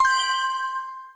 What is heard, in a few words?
Eliminate Icon